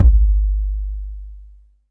BD, Dance Mega Drums, HIP

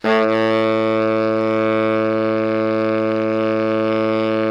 BARI PP A1, Sax; Giga Samples Collection; BARITONE PP

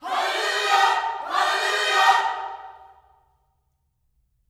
HALLELU, Gospel; Spectrasonics; Vocal Planet; HALLELUJAH

